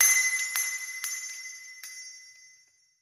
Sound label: Christmas Shop Bell